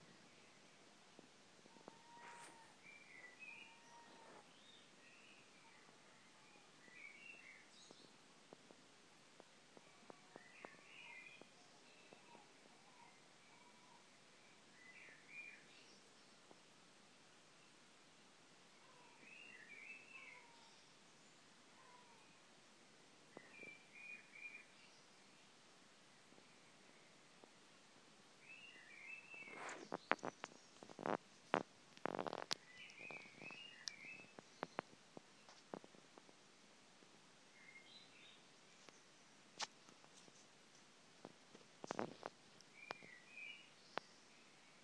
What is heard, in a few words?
dawn chorus